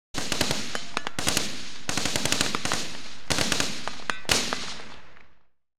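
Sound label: Warfare, 5-56mm Light Support Weapon; BBC Sound Effects Library; Explosions Guns Alarms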